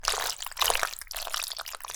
oil footstep; dfcaverns

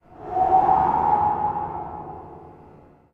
nether fumarole

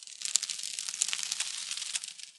spore tree pitter patter; trees; dfcaverns

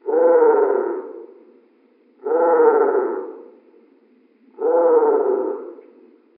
crow slow; ambience; dfcaverns

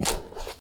fungus footstep; dfcaverns; trees

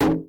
arrow; throwing arrows

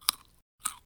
chomp crunch; farming; dfcaverns

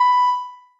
noteblock b 2; mesecons; nalc server

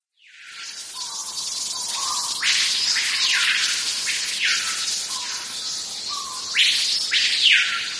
jungle day; ambience

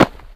player falling damage; nalc game

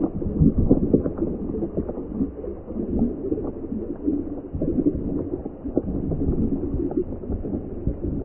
scuba calm; ambience

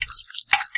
nether extract blood; server-nalc

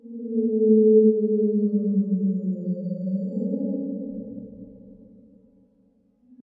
whale; dfcaverns; ambience

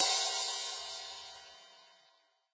noteblock lite crash; mesecons

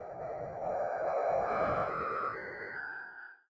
mobs mese monster; server-nalc

